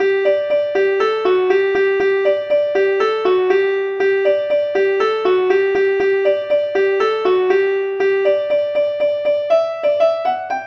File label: polka